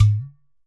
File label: GHATAM